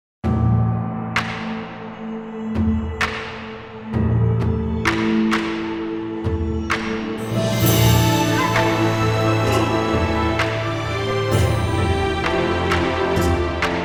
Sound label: gongting